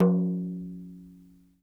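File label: Small Frame Drum Ring, World Percussion One Shots; Sony CS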